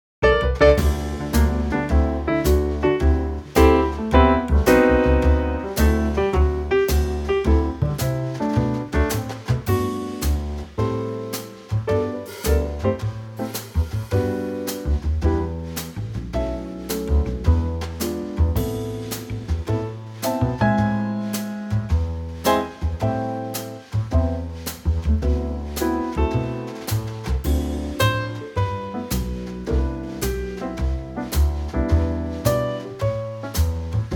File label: G Trio